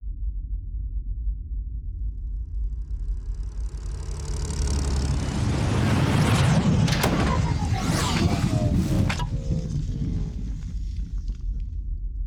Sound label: Titles Start Sfx; LEGO Worlds Monster Pack; Cutscenes